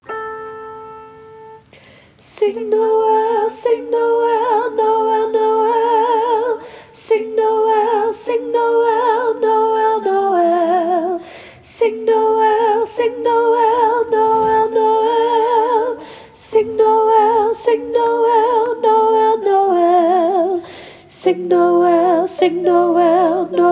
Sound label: African Noel Alto Lead